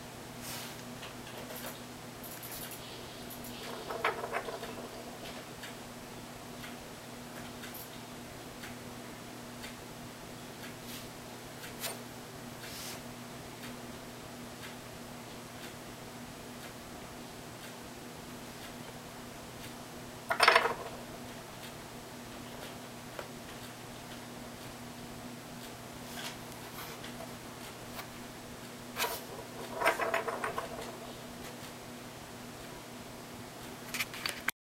rattleback in action

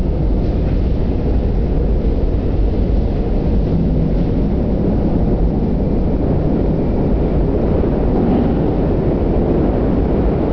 train arriving

